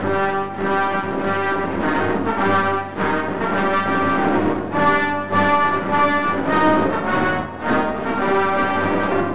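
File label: Musical Imperial alarm loop; lego star wars the force awakens 2016 ps4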